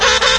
peng hunt, penguin

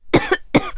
COUGH FEMALE; combatarms

